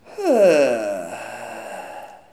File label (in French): ah soulagement